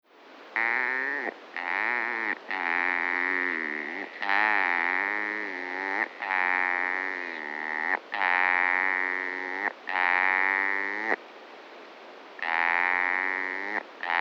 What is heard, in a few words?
Rana latastei